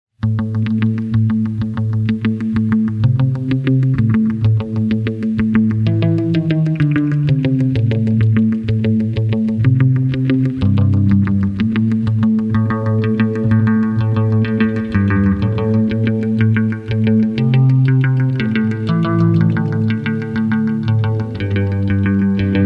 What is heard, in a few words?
slap